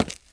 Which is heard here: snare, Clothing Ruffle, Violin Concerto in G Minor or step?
step